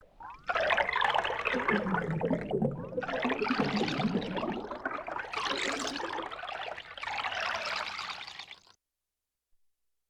pouring up stereo, Yamaha TX16W; Sound FX

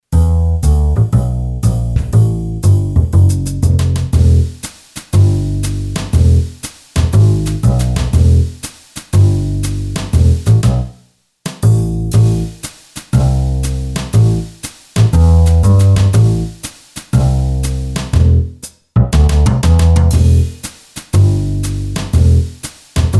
BRUSH, Boss DR-3

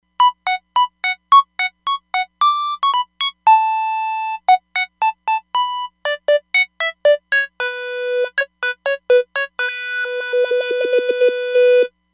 Pure Square

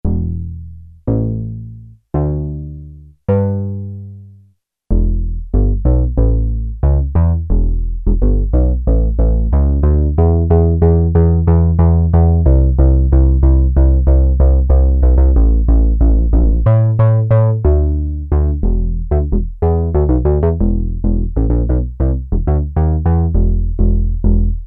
Bass Guitar Unison; Sequential Circuits Prophet-5 (Ver.3); Factory Patch; File 2 Programs

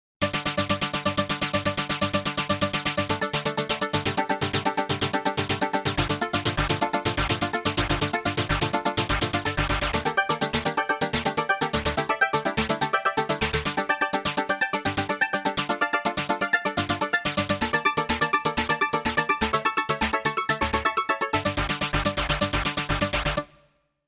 Voquencer, synth

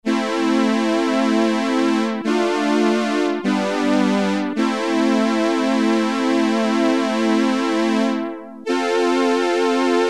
STRING ENS, Casio CZ-1000; Preset

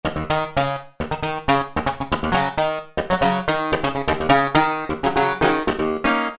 Flanging Clav; Roland PN-D50-02

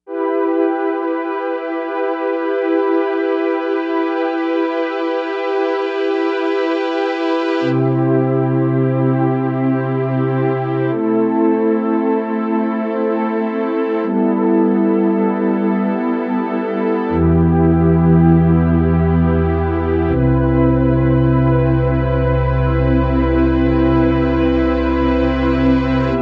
Stringy Machine; Factory Patches

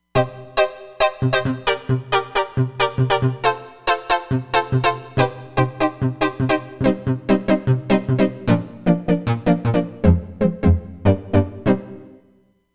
Memory Pluck, Roland Fantom XR

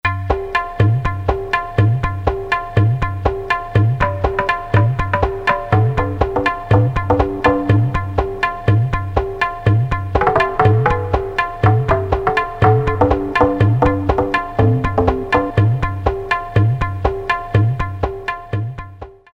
Rhythm 2

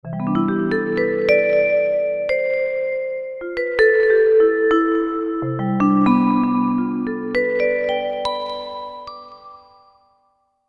Stero Vibe, Korg MSC-1S; Korg MSC-1S Variety

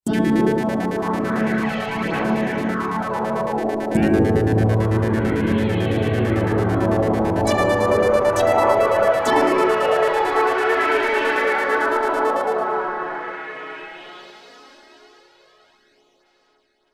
Space Pulse; Roland SC-880; Factory Patches

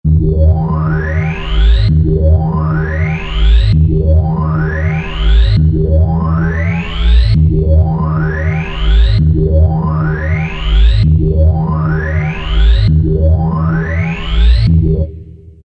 Space Rez Lp; Alesis Rap Techno Dance

